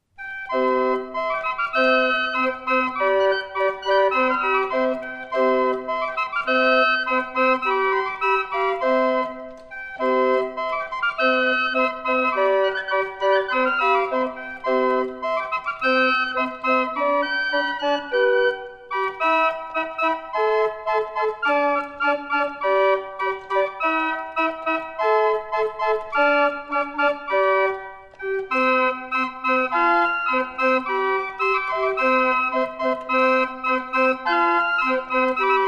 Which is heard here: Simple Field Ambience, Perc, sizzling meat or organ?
organ